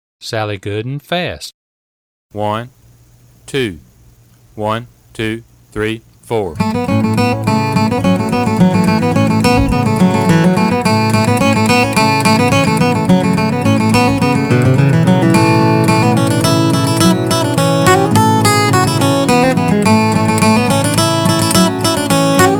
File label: Fast; Sally Goodin